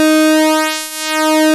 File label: PULSE MOD